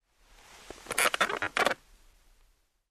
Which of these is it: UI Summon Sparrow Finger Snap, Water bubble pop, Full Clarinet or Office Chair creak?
Office Chair creak